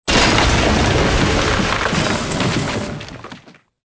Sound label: debris; Soldier Of Fortune II Double Helix; XBOX